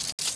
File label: m3 pump, weapons